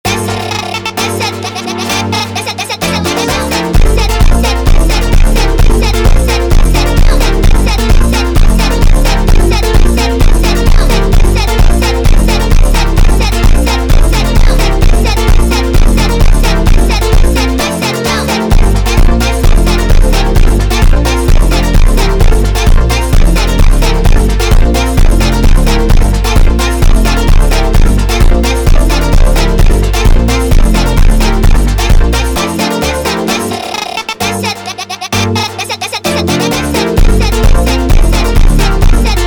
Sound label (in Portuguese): Montagem Vozes Espaço